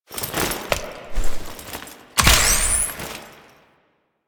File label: Pickup; Duviri; Town Escape; Sirocco